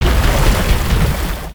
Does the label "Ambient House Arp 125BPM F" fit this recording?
No